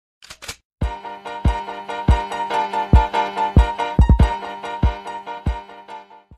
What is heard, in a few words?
instrumental